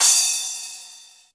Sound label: CRASH JAZZ